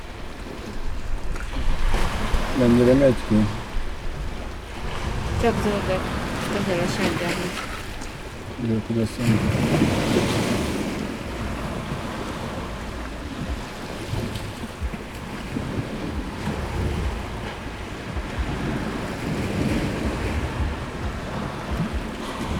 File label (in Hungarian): sziklamélyedésből 6 méterre a víztől, tenger; thassos 2016; metalia beach